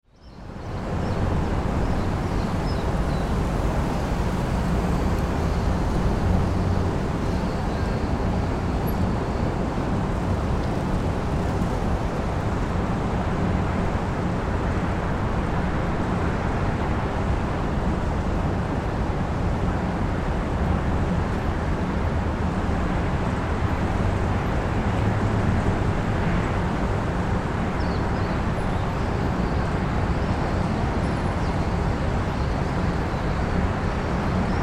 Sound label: Ambience City Rooftop Perspective; City Sound Effects; Cities (Sound Effects:Backgrounds); SFX Bible 6